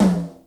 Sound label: quilted, toms; Real